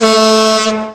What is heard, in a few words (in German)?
Horn mittel